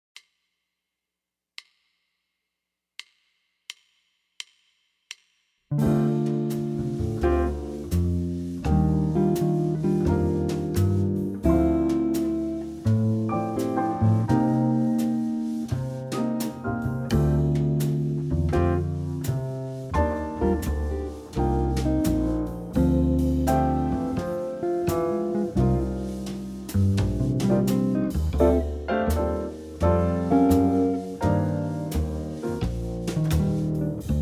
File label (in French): exemple mi phrygien